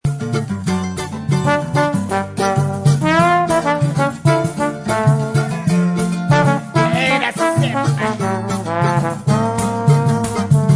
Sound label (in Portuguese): Samba & Choro; Samba Pagode